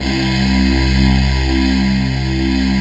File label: GIRL SH, FAIRLY VOX; Synth